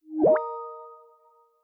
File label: joined conference; Microsoft Office; LYNC